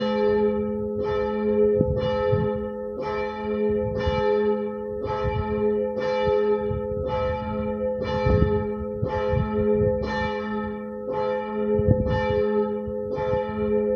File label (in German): Glocken Gelaeut